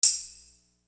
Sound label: Tambourine; Sonic Foundry (Sony Creative Software); Infinite Soul VOL-1